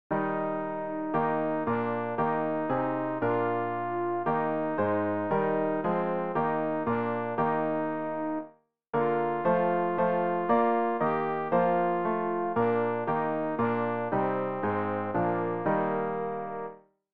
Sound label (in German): alt, Übehilfen; Ref. Gesangbuch; RG 178: Nun schreib ins Buch des Lebens